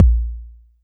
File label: BD; TRX